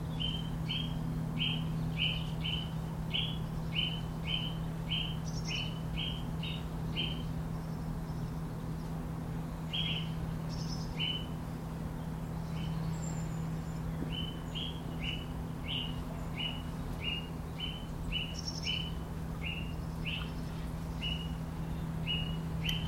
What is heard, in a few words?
birds rhythm, field recordings